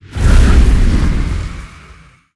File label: sfx corvette moving out; Stellaris; nemesis